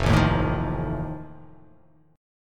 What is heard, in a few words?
F 7th F down; F7 strummed